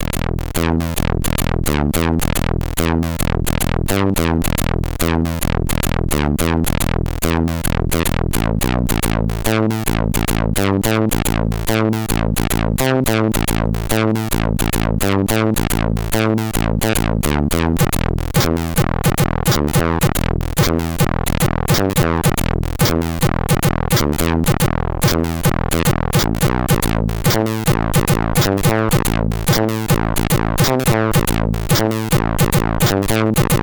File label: Screen 1 (SID Stereo); Hollywood Poker Pro; Commodore 64